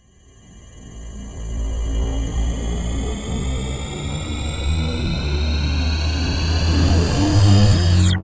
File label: prepare fire; romulus; Earth and Beyond; EA Games